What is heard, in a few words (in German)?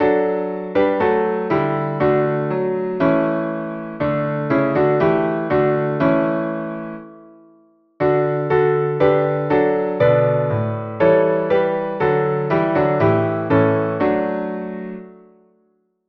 Klavier